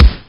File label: fist hit; dn3d r